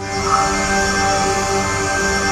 GALAXY; NorthStar - The Wizard VOL-2; THE VOID

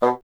WND BSN, Bassoon

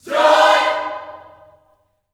JOY CHORD, Gospel, Vocal; ILIO; Vocal Planet

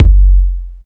KICK R; Big Beat Samples & More; AKAI

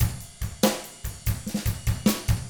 ROCK 6-8, Drums; ILIO; Double Platinum Drums 1

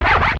scratch, Yamaha DD-8

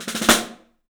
STEEL ROLLS; 3D Drums

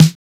DRUMU SNARE, DRUMLATOR; Drums In Your FACE!

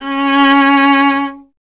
viola C; Miroslav Vitous; Solo Instruments VOL-1

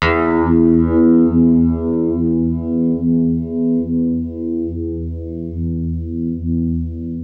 UNIVIB E, Guitar; East West; Steve Stevens Guitar Samples Collection

